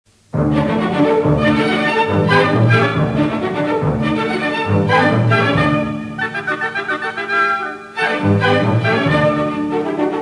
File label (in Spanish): bolera musica sonata; material didactico